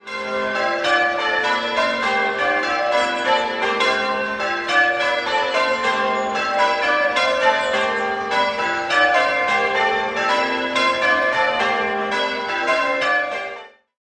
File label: bells